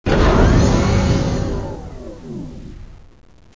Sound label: door; cruisecontrol